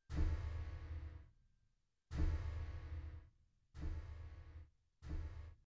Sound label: damper